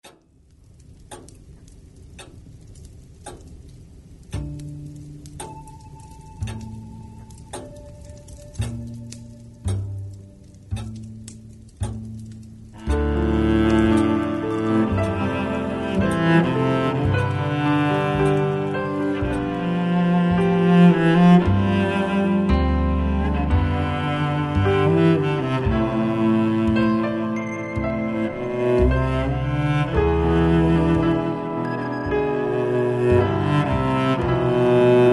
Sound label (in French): violoncelle